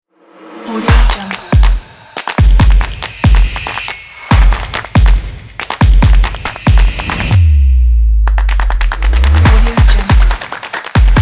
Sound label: percussion kit